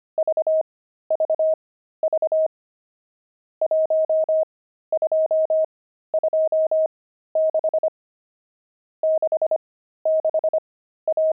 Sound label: pangram, intermediate pangrams files